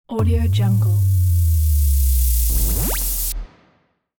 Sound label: sweep boom